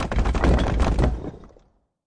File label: first block; pyramid